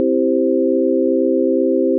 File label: chord